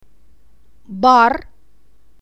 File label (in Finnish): ruokala